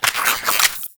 Pistol Reload; Warframe; Sentient; Crp Sent Amalg Pistol

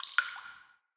Cave Ocean Drip

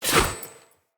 Short Sword Throw; Weapons; Player

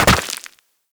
Land Soft Rock, Footsteps; Characters; Operator

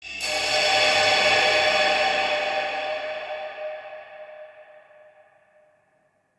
Root Cave Magical Chimes; Ambience; Neathuns Root Cave

